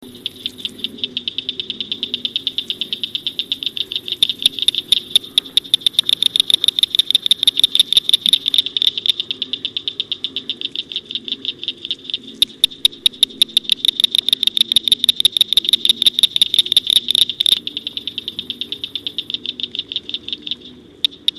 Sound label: cricket frog, frog calls; amphibians; terrestrial